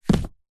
household book large thick set down on top of another; zapsplat